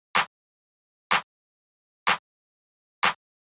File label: Clap After